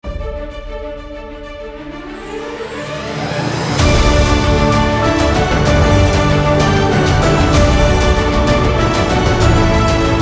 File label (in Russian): Фанфары церемония